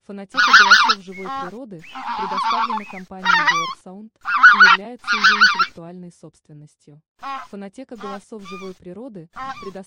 Белолобый OCA LOMBARDELLA oca lombardella

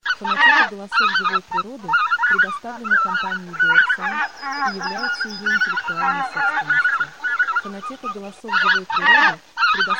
Белолобый

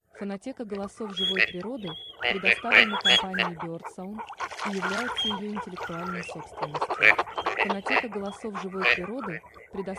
Утка серая; CANAPIGLIA

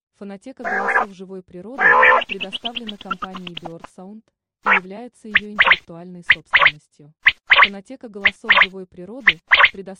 Перепел; QUAGLIA

